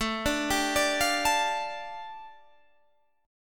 A 7th Suspended 4th Sharp 5th A Guitar Half Step Down, chord